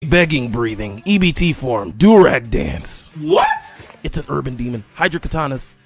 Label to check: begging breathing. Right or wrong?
Right